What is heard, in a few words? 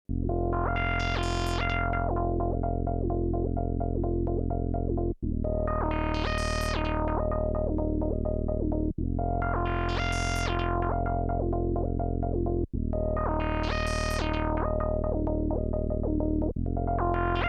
LFO Ostinato